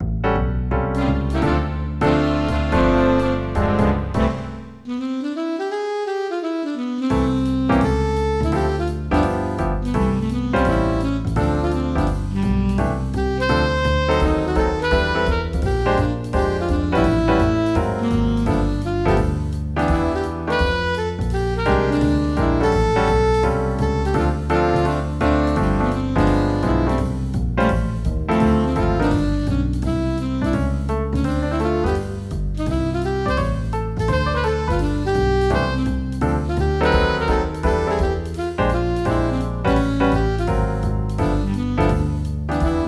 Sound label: 4 horns Alto Solo; Combo Charts